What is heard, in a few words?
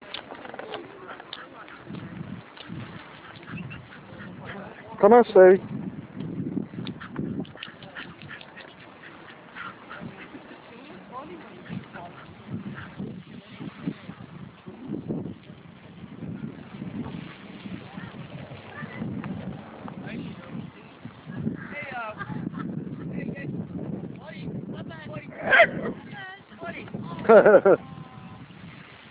wheelchair walk